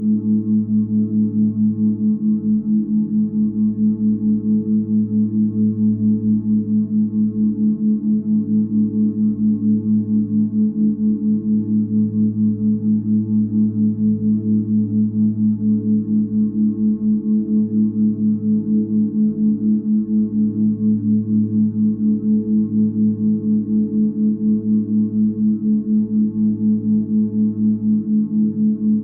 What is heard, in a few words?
Theta 4 Hz; REM Sleep